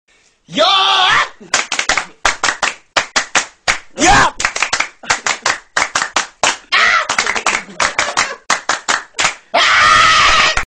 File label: Cheer